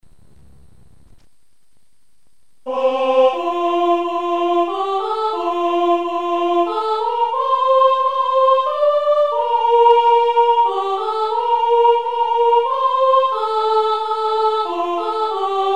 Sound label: away soprani